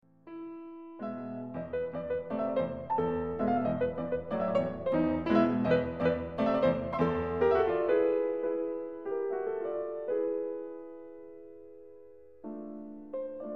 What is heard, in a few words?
mazurka; Chopin; romantisme